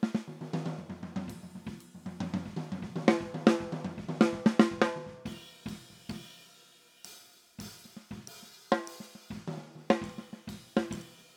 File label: SNR